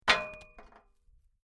Rock Drop On Metal And Settle, A stone impacts with rusty metal; A stone impacts with rusty metal, rattles and settles.; Rock Sound Effects